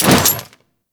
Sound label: Quill Dart Gun Mode Switch Fire